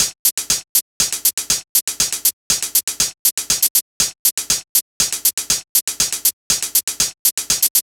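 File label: percussion cymbals closed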